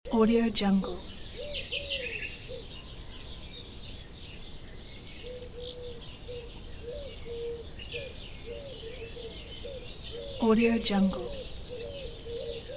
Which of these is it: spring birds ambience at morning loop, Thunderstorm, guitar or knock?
spring birds ambience at morning loop